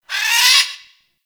Forest Creature Squawky High, Ambience; Warframe; Grineer

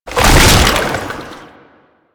Run Start Side, Foley; Enemies; Warframe; Proto Infested; Babau

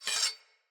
Sword Unequip; Weapons; Player; Swords; Fenn Jotar Sword

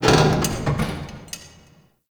Car Creak; Warframe; Ambience; NineteenNinetyNine

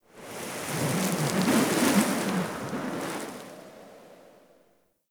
wind gust medium; Ambience; Warframe; Eidolon Plains

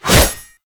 Bayonet Melee Whoosh; Warframe; Tenno

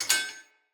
Split Sword Join, Swords; Weapons; Player; Starter

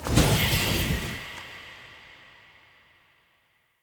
Freeze Mote Pickup Trail Move Burst; Motes

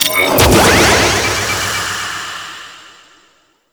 Energy Pillar Insert Full; Warframe; Colonist Rescue

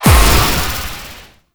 Venomous Activate; Warframe; Eximus

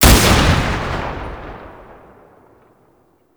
Exalted Sniper Shot; Warframe; Frumentarius

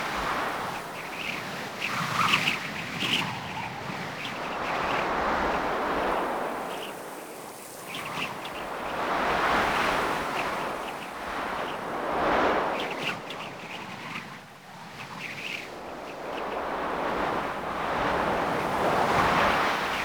Wind Grass Large; Ambience; Props; Warframe; Eidolon Plains

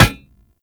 Scythe Ground Plant

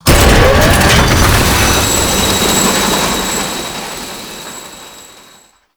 Elevator Enemy Start; Jade Shadows; Ascension

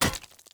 Short Sword Medium Impact Rock

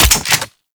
Pump Shotgun Mech B; Weapons; Warframe; Zariman

